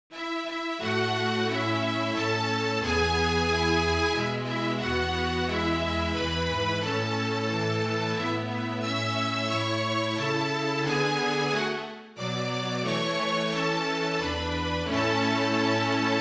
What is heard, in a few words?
Happy Birthday Quartet